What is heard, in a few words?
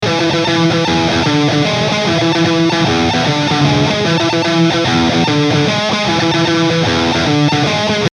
BRIDGE, eclipse active 5150